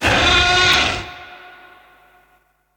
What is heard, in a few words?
wild short; DinoReels81WD; wazdan